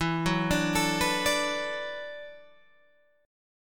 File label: E 7th Suspended 2nd Suspended 4th, down, Guitar, Open G